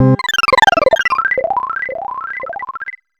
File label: shield; mother2